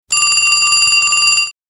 ringing bell

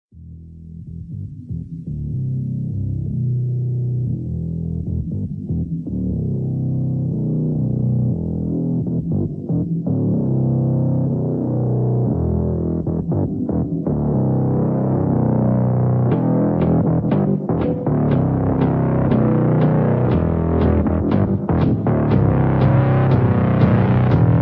dubstep remix

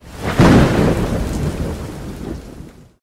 Thunder Notification

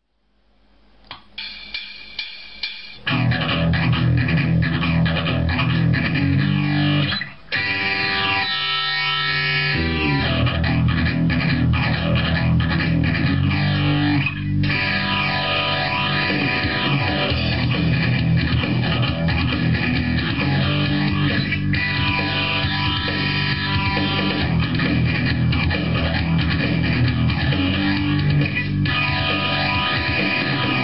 guitar

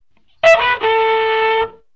3 message tone; ringtones; sms

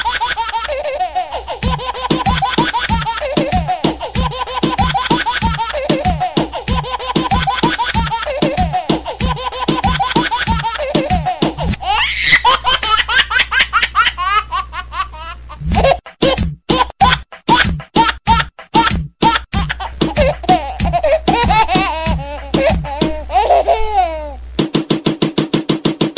reggae

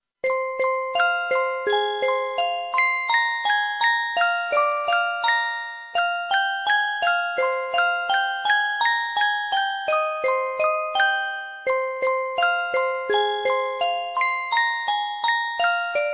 melody; z tapion

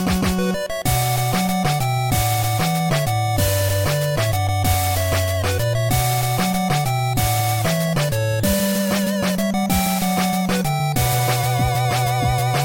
8bit

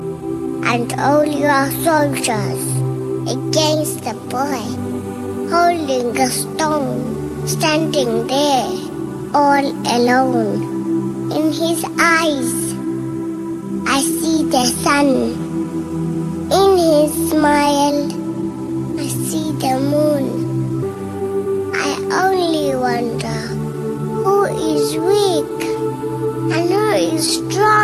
baby very nice, ringtones children

